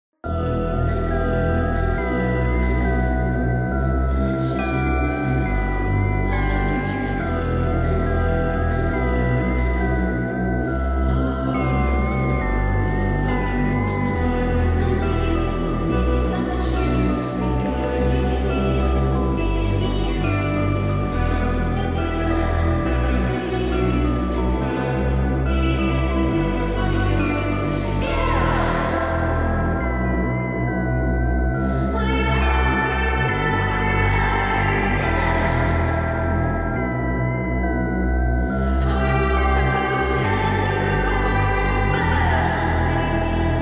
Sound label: music